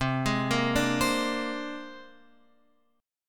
C 7th Suspended 2nd Sharp 5th C down Guitar Open F; C7sus2#5 arpeggiated; JGuitar